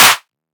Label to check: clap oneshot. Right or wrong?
Right